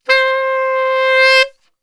C 2 SAX, Brass Super Section; Best Service

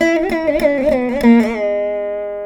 VEENA; Spectrasonics; Heart of Asia

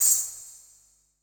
E SHELL, ETH PERC

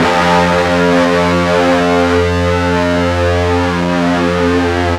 FUZZ F 1, Guitar; East West; Steve Stevens Guitar Samples Collection

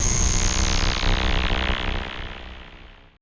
AMBI FX

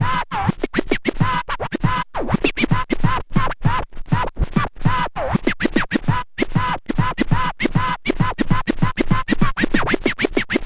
scratch long, Vinyl Attack, scratches

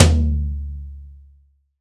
TOM, DRY+AMB TOM

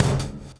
DOWN; DOWN KIT; AMG Gota Yashiki Groove Activator

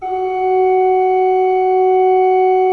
F3, Cathedral Organ

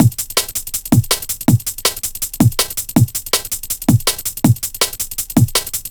162 Tiny, Loops, breaks; m8 breaks

